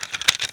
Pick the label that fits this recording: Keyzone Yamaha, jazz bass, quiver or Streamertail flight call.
quiver